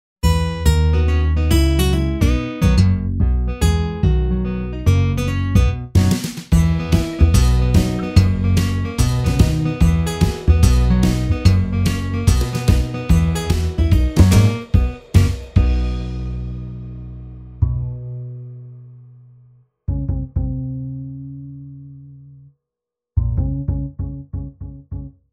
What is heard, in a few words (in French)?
GUITARE ECOLO